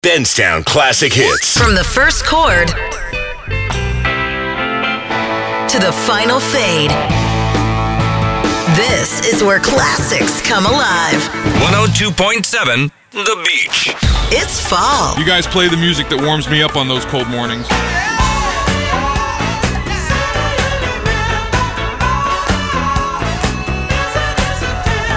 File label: Classic Hits Composite